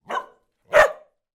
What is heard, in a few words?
animals dog med bark inside